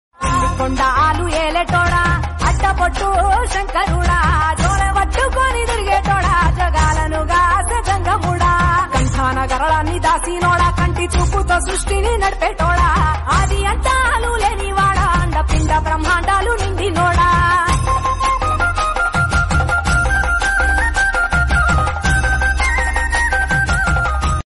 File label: SHIVARATRI SONG RINGTONE